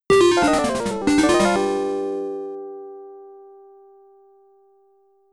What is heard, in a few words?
Miss; kyros arcade